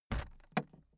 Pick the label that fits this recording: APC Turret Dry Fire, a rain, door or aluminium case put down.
aluminium case put down